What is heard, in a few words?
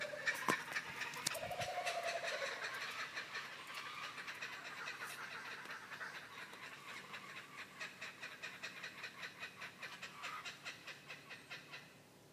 Kookaburra